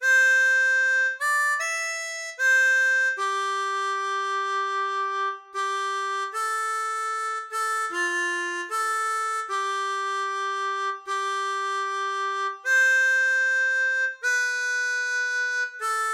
Hymne irlandais, harmonica

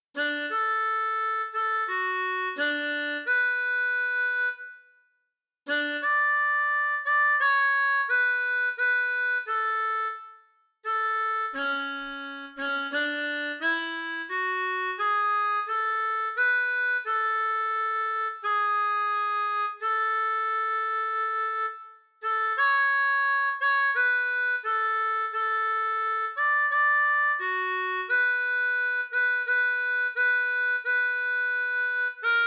Hymne danois, harmonica

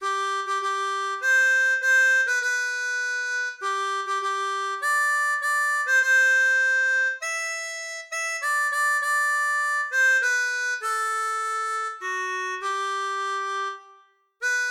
Hymne suisse, harmonica

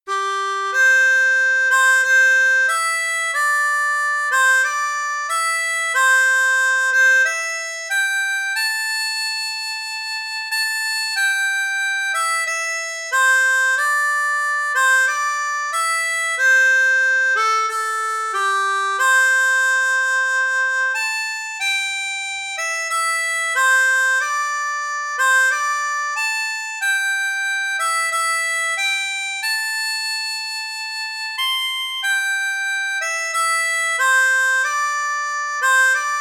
Chant des adieux, harmonica; Folkloriques

